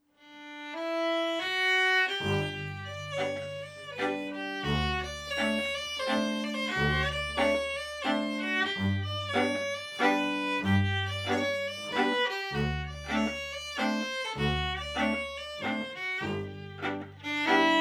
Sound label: landler; die harben-lenz landler